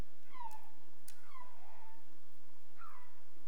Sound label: Beluga Whale